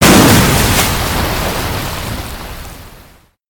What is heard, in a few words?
Big Splash; Water Splashes